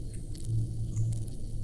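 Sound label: Music Dpad Evaporate 145; From Dust; ps3; xbox 360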